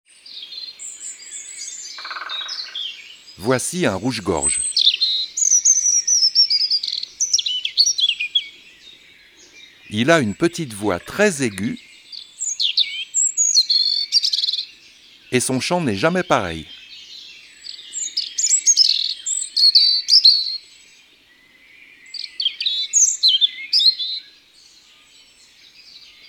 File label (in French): Les oiseaux de la forêt; Documentaire